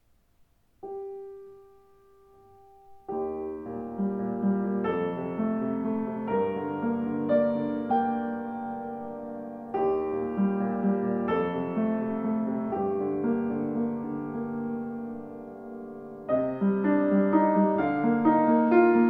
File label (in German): Schimmel Klang, Klavier; 109 schimmel nussbaum